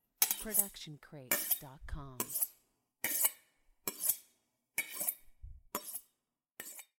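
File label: Sharpening Kitchen Knife; soundscrate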